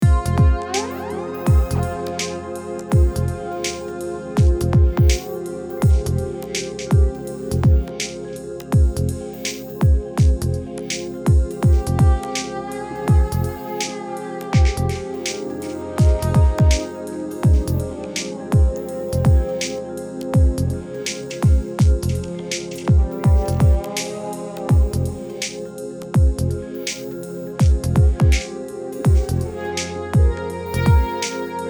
Warm Vibes 60Hz BOOST 240Hz ATTEN 8kHz BOOST 20kHz ATTEN